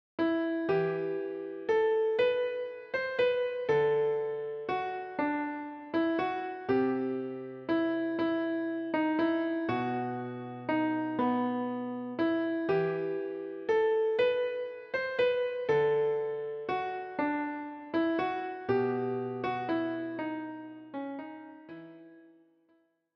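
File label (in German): Greensleeves, Klavier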